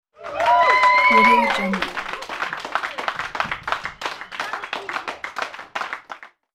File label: cheering small group